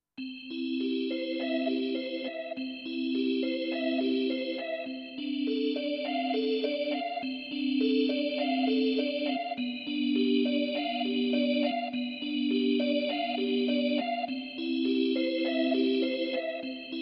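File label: Rotary Organ, digital piano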